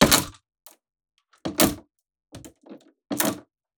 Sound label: hangers bang, Foley; TRUTH-HZ; Crowdsourced Sample Pack